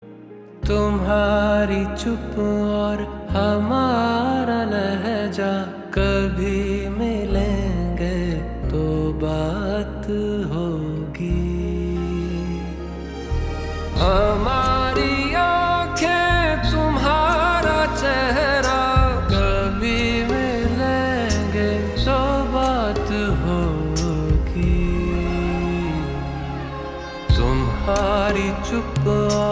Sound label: Ringtone